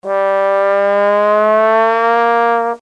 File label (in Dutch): trombone glissando; blaasintrumenten